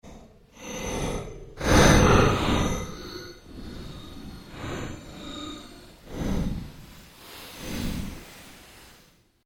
ambience fluctuation, Sound effects created by manipulating other sounds; Rise And Dive; Rises and Dives